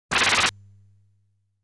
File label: Thunder Launch; Phase 3